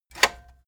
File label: cassette eject release